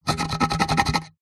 wood scratch sharp object; foley; zapsplat

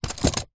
sport body builder belt set down

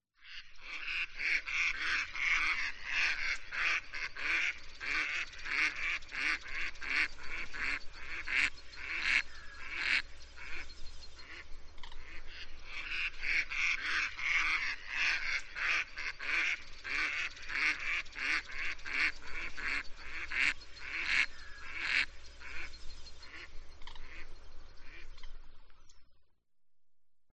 Bird Mallard Duck; audio hero

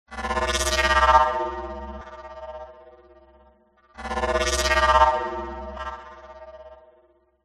fm stutter digital delayed; sound design

